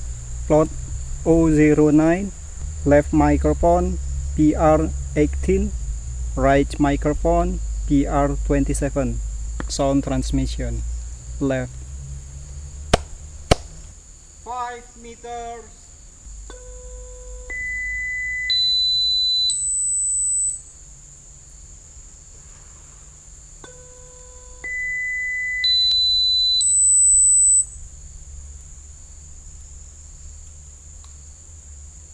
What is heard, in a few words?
birds